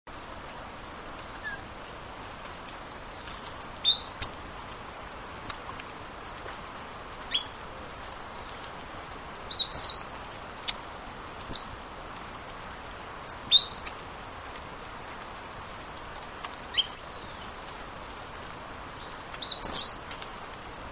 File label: stripe headed brush finch